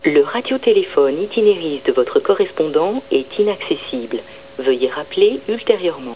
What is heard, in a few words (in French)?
Radiotelephone Itineris Inaccessible